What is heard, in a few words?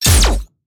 Plasma Fire, weapon